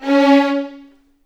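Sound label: C#4, The Ultimate String Collection, violins marcato; East West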